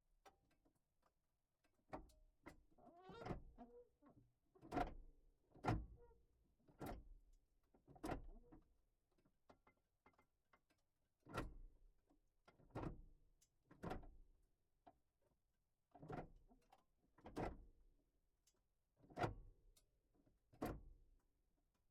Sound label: Audi Quattro - Gear Stick Dry Movement; MKH8020; Ur-Quattro 1983; ENGINE - Center; Pole Position